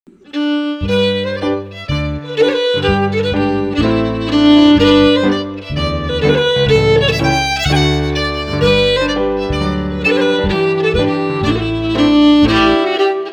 FIDDLE PIANO; Jink & Diddle Book o' Tunes 2022

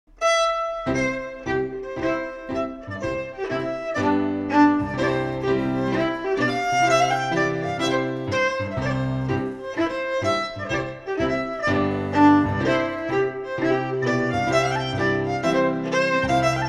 Reel FIDDLE PIANO; John Macglashan col 1796; The Jink & Diddle School of Scottish Fiddling

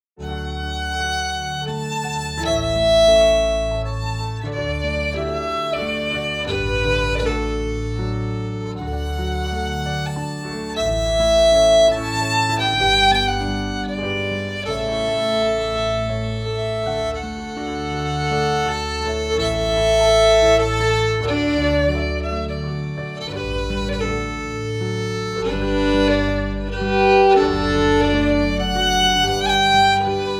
FIDDLE PIANO; Jink & Diddle Book o' Tunes 2022

